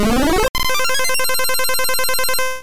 Aeroblast; sfx; attack moves; pokemon; gen 2; gsc

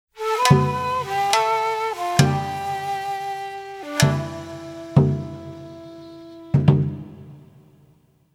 Victory

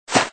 Grass

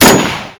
QBU88

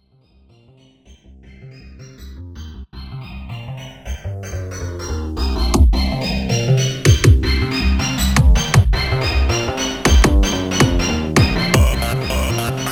Metal Song; Friday Night Funkin' Illegal Instruction mod Windows gamerip 2022

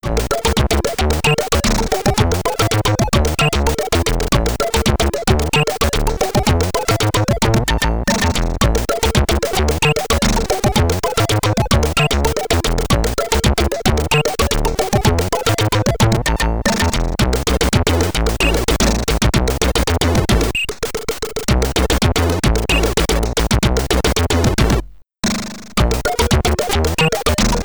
High Score (SID Stereo); Heli Rescue; Commodore 64

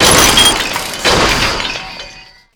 machine break

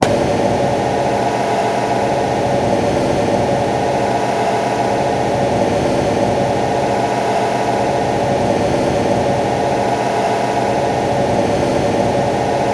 shoot auto; Kill Pill; Windows

